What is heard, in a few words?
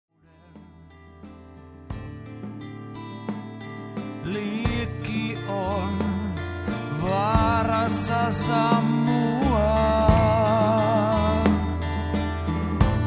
unplugged